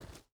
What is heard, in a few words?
footstep rubber boots dirt jump start; Rust